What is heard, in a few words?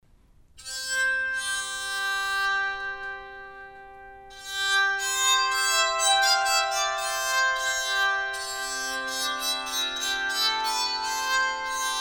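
redwood walnut tenor sound, bowed psaltery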